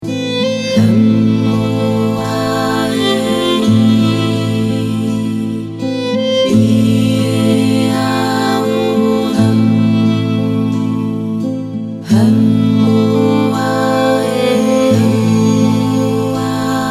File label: Healing Sounds